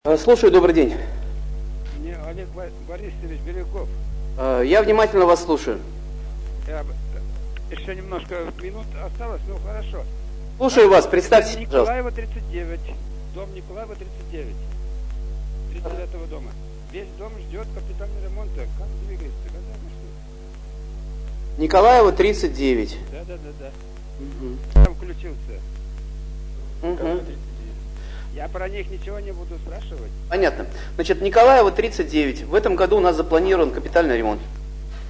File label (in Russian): звонок